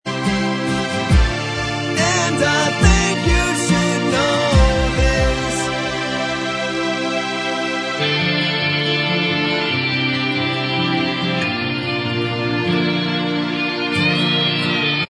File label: Bvox Track